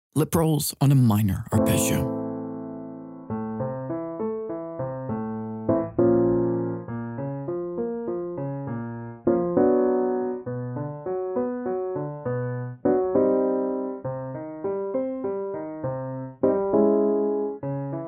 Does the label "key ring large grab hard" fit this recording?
No